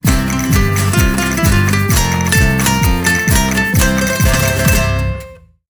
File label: Match Win; Don Flamenco